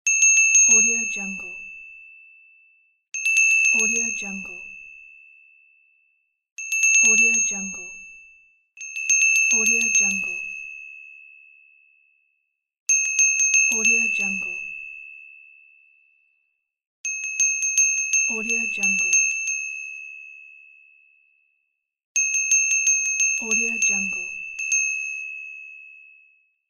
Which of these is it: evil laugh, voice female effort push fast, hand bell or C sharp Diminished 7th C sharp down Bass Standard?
hand bell